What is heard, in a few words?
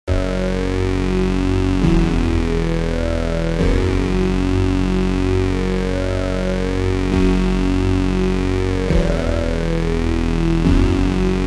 Vox Bass Dry2Wet